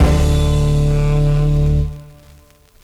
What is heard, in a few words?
Horn Stab